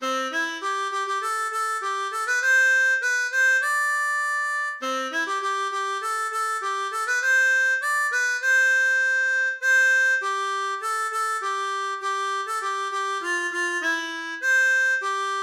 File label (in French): La polka des marins, harmonica; Chants de marins